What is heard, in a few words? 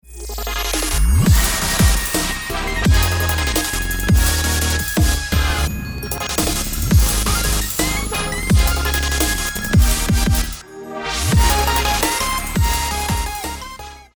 Master No Comp